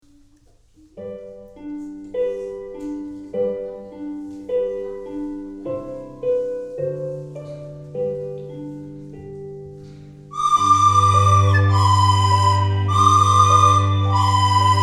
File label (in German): blockfloete leise leise